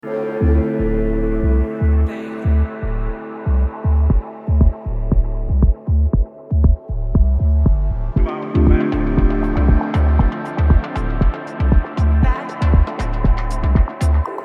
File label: dub techno